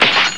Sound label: sheathe, weapons